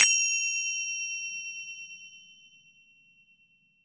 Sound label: FINGER CYM; AKAI S-Series CD-ROM Sound Library VOL-5